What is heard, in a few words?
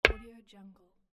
interface delete